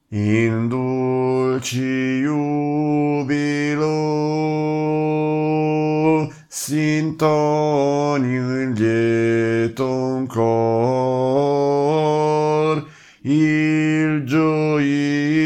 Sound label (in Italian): contralti; In dulci jubilo